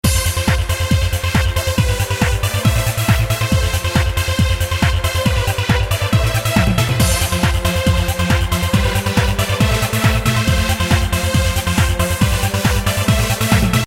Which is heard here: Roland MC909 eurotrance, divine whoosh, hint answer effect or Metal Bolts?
Roland MC909 eurotrance